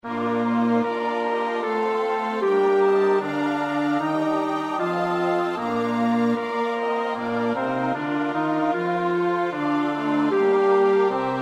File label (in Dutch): bas; Bach (Johann Sebastiaan); BWV 097